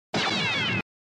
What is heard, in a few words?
Cylon Rifle; BSG